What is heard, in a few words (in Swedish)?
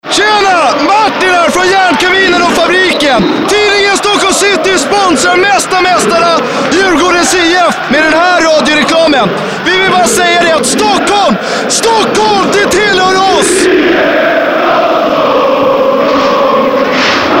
egna klacken; DIF